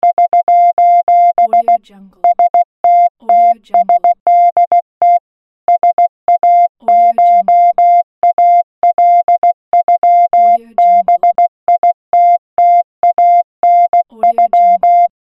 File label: morse signal sos